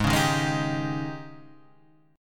Ab Major 7th Suspended 4th Ab down Guitar Half Step Down; AbM7sus4